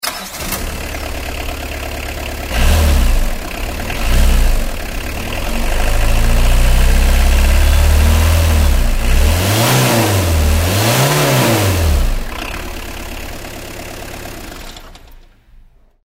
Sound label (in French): c15 moteur, citroen c15 1.4